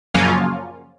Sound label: Synt Shot; Unit Rcrds